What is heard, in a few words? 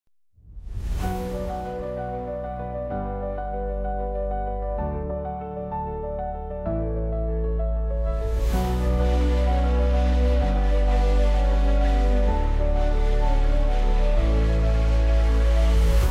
hare krishna bhakti breeze music, bengali; epic